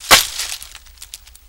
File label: Brush Crunch Sngl, trees; Brush Crunch, Single